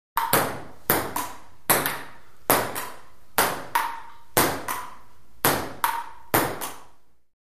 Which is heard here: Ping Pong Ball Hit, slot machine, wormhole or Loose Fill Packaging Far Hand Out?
Ping Pong Ball Hit